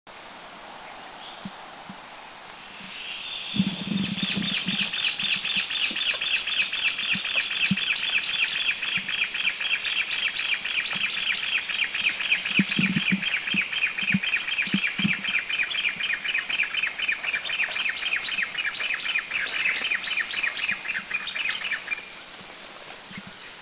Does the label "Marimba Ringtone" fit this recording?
No